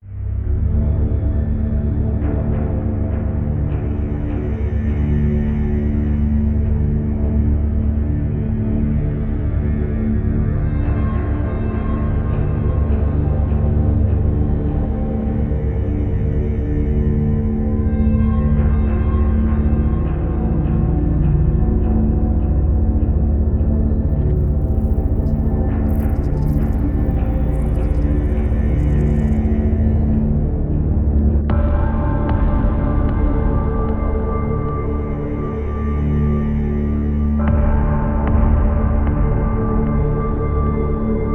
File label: Creepy Ambience